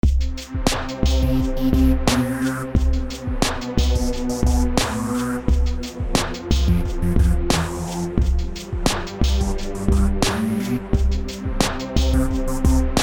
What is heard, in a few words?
Midi